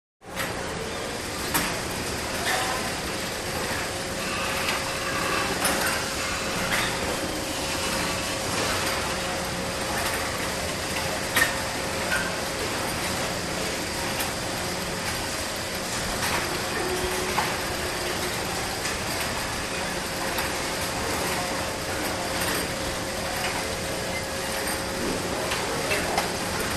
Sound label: Assembly Line Ambience; Close Perspec; Close Perspective Machinery Hum With Medium To Distant Voices, Air